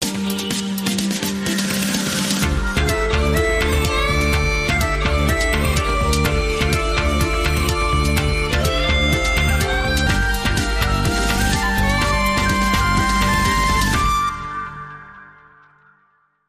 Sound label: Celtic Trance